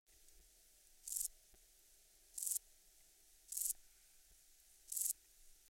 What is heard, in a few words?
Euchorthippus declivus, Thermenlinie